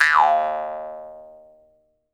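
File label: Jaw Harps, Pitched Percussion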